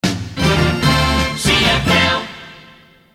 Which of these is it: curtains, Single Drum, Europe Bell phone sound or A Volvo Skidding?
Single Drum